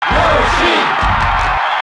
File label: Cheer; Yoshi; SSB